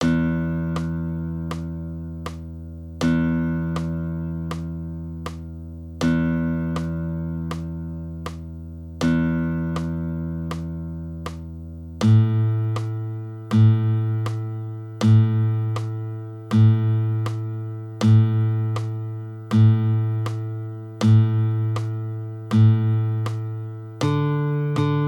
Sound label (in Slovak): Rytmicke cvičenia nôt